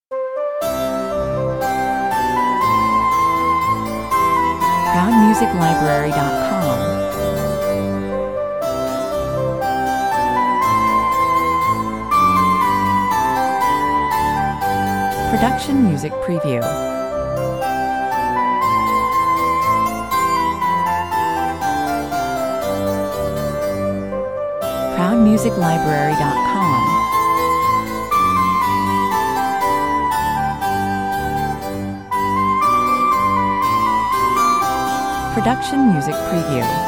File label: gavotte for flute 120 bpm